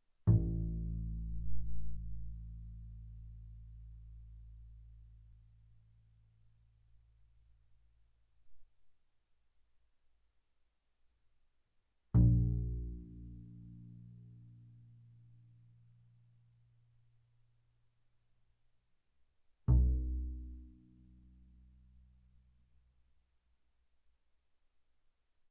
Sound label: Bass pizz sul A ff A1 B1, double bass